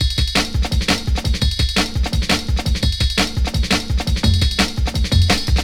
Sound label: Drum Break, Drum & Bass; Fluid Dynamics